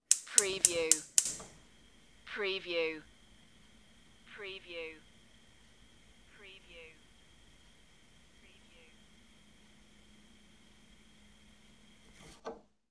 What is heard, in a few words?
Stove Gas Ignite Run